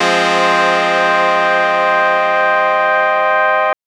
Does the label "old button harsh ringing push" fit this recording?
No